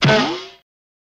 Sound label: Honk Hit Cymbal; horn